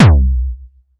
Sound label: Tech Pulse, Kick Drums; Zero-G; Soundclash